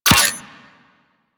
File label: Guandao Pistol Projectile Attach; Warframe; Tenno